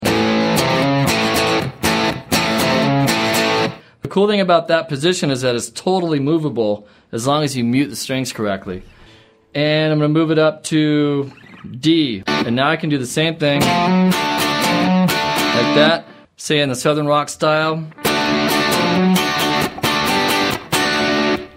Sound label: southern rock licks